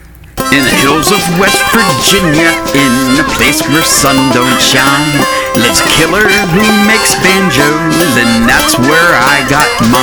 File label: Banjo